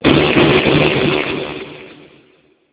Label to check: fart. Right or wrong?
Right